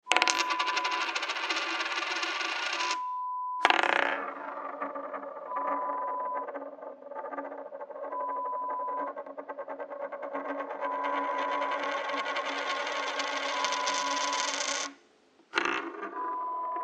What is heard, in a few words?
Spinning Coin sound